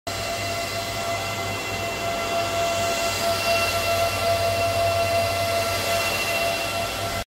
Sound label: bitcoin miner